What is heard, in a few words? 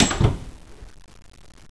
bell